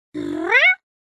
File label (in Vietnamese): cô gái bắt chước tiếng mèo kêu